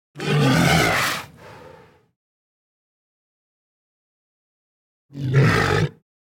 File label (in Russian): zvuk morzha